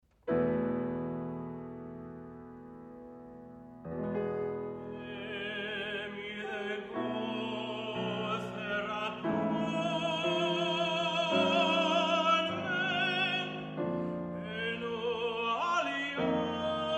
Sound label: Harp Song; NERON KAISAR